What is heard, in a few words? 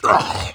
pain sharp; garrosh